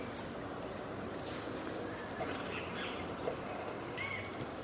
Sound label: Blue tufted starthroat; پرنده اخترگلوی جقهآبی